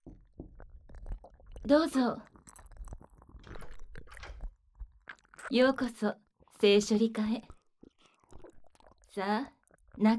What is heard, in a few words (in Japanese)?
耳舐め 手コキ フェラ パイズリ される音声; AVメーカー社員のベテラン性処理担当さんに手厚い福利厚生で手コキ・フェラ・パイズリされる音声